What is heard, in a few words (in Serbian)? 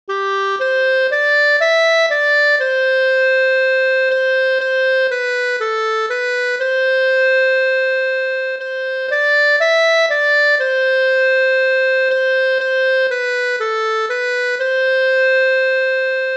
Klarinetska etida